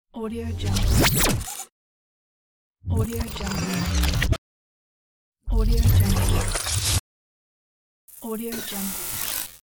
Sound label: robot sounds of the future; umcsound